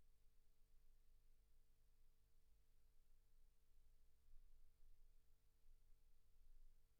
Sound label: silence 48kHz